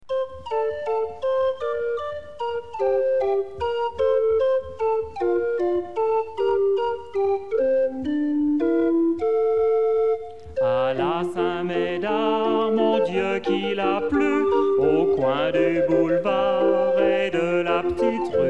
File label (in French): orgue; medard